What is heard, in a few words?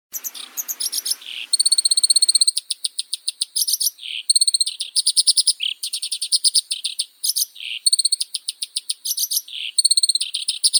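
black-browed reed warbler, Acrocephalus bistrigiceps; kamishovka chernobrovaya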